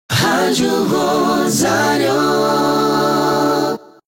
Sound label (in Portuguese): VH ACAPELLA LENTA; shopping; ROSARIO